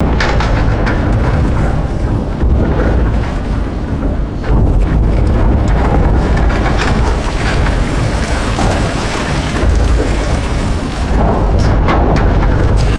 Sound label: reverberant vehicle passing over metal grating bridge